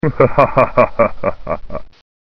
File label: laugh